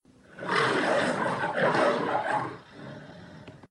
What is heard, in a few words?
lion roar